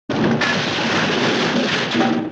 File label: crash, factory